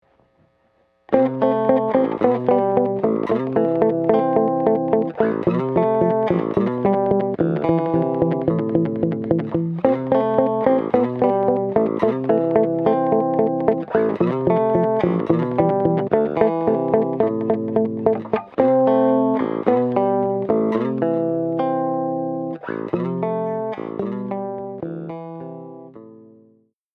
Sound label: slap chords no haas, bass; mike lull